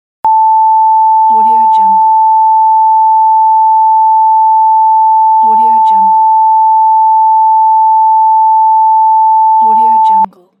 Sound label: no signal; 3dhome